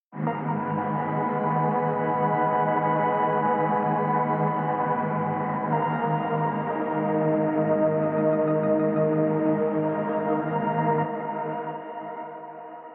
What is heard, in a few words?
epic drone pad